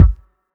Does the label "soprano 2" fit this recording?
No